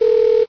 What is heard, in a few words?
ringout, windows xp; operating systems